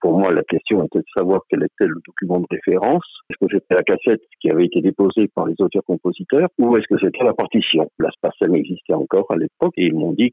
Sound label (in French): HYMNE, l'hymne du pays; ia ora o tahiti nui